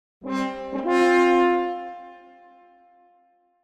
Horn Fanfare Victory Signal; Hollywood Edge; sound designer's toolkit v2

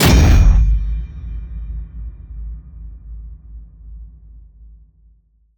HIT Massive, Cinematic Hits Designed

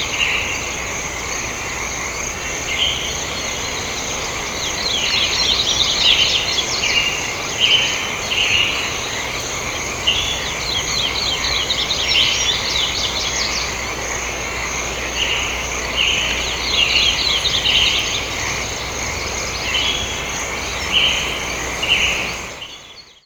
pond bird frog; best service Blue Box

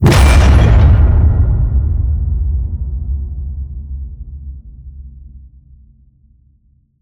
HIT Metal Crash, Cinematic Hits Designed

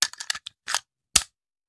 Hand Gun Foley; Sound Ideas; Guns

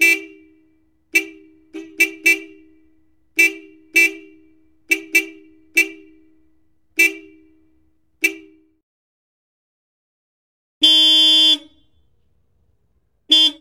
horns car mix; best service Blue Box

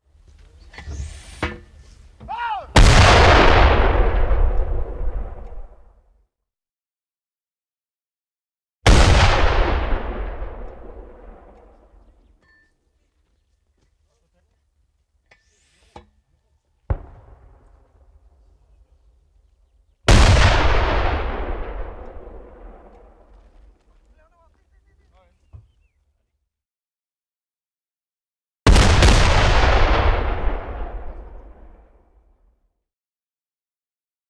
Mortar Cannon; Sound Ideas; Guns

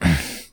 exert; blindside

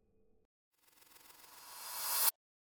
Whoosh Reversed Cymbal Approaching - High; Hollywood Edge; sound designer's toolkit v2

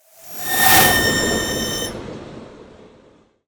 Ice Spell Whoosh, Cold Whooshes; Crystal Chill; Arctic Aura

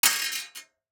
DICE on Metal, Throw and Roll, Mini, 2 Two Dice; Samples; ArticulatedSounds; DICE Sfx Party (Extended)

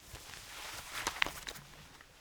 headphone Take Off; Apotheorasis

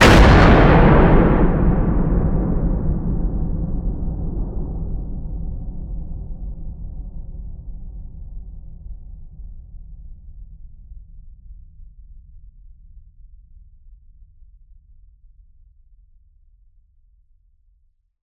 HIT Distorted, Cinematic Hits Designed

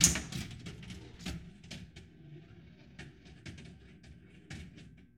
Pinball Flipper Hit Ball

